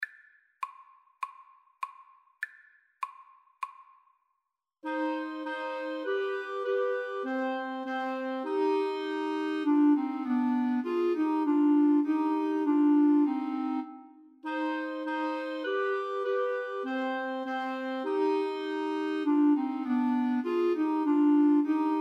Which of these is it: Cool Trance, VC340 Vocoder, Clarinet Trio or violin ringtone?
Clarinet Trio